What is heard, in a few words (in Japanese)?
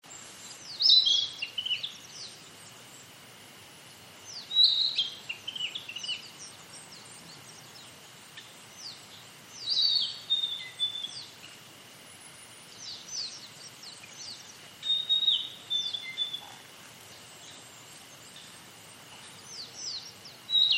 kibita